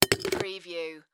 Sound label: Coffee Pot Remove